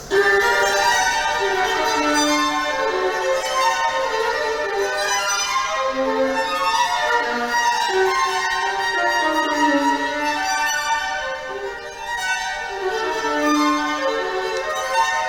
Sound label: VIOLIN